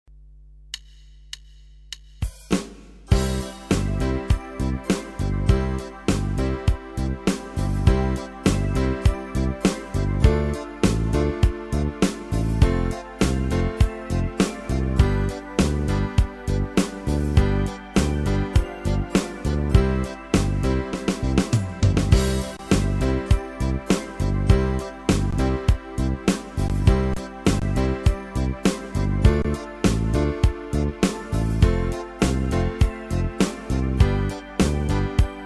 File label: Jingle bells